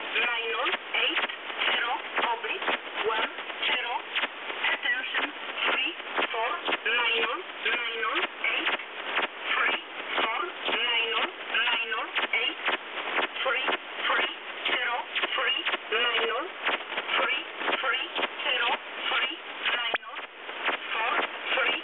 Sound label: usb msg